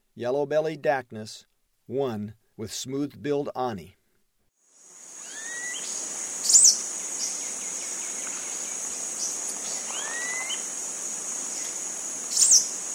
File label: Yellow-bellied Dacnis; S. America; Eastern Lowlands